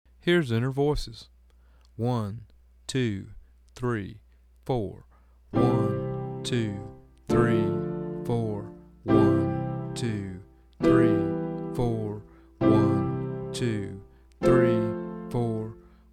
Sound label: Inner Voices Slow